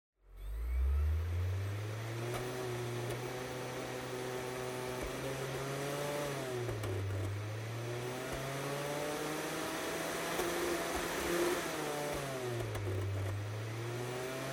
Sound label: Optical Disk Drive Operating Noise